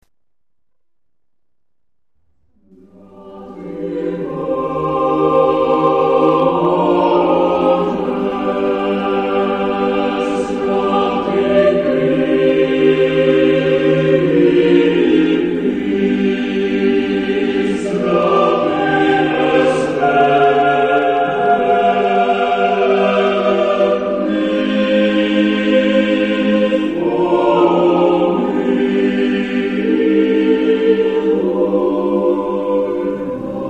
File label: chamber choir